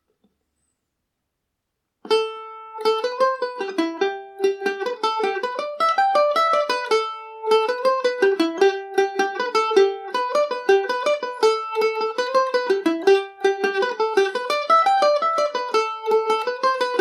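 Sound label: A dorian, Irish mandolin; lark on the strand revisited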